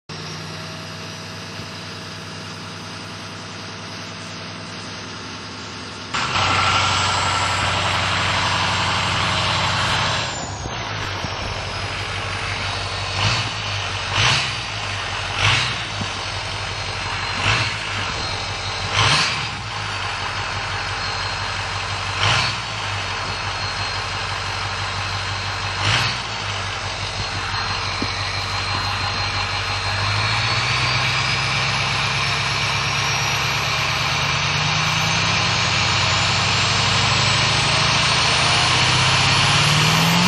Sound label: ford power stroke stereo, trucks; auto